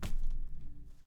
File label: bandage drop; Phasmophobia